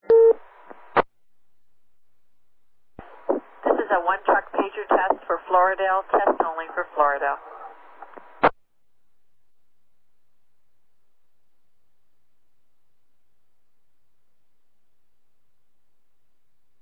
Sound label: medical page received; loradale